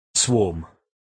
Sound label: swarm